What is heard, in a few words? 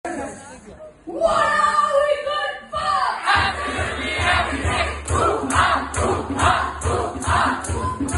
Chant